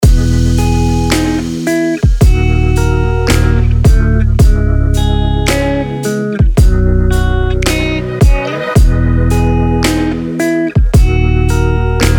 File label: LoFi Grooves